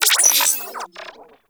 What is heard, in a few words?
Digital Glitch; Ambience; Warframe; Corpus Railjack